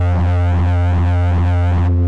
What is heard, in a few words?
sine ting